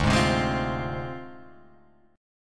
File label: D Minor 7th Flat 5th; Dm7b5; strummed